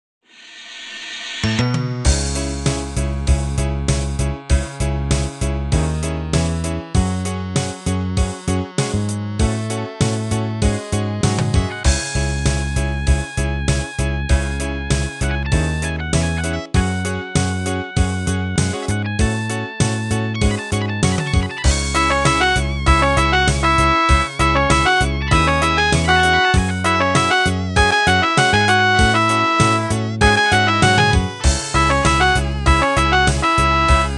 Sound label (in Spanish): TIMBA